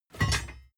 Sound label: plate armor pick up